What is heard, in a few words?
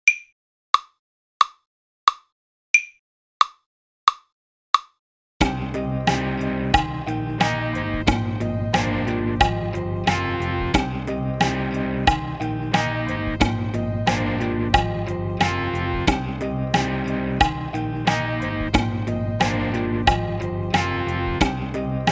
In Time Example